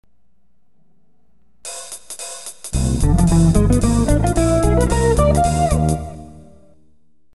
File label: jazz